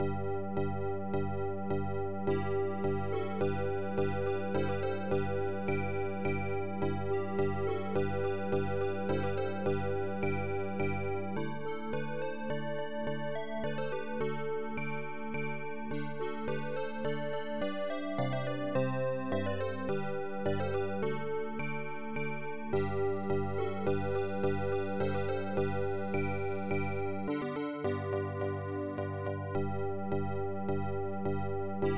DRUM BOY

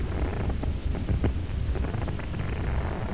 swing; Quake; PainKeep; zombie

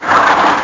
SKID MUD; ignition

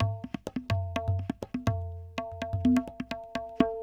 PERCLOOP ETHNO 125; eJay

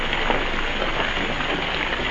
rain

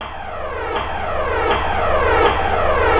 D+B 160; eJay

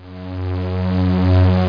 buzz in; 3dcyber

